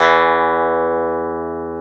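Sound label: E 12 String guitar; Club-50 - Foundations Roland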